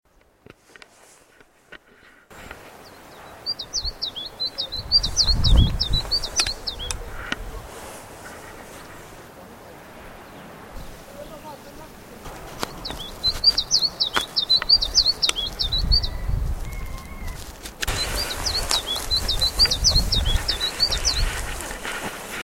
taigazilpzalp

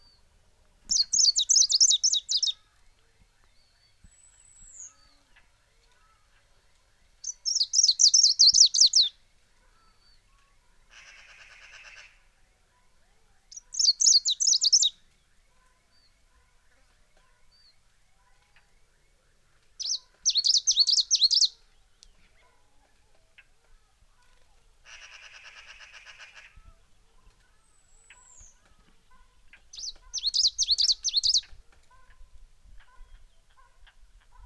gruenlaubsaenger

